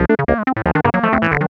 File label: Arp, Keyboards